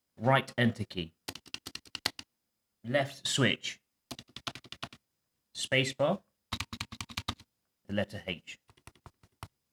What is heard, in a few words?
2nd Gen Linear Optical Switch